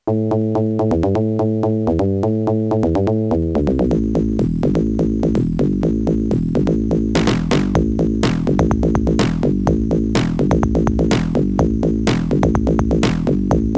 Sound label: adlib popcorn